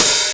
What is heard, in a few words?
natural open hi hat sample d key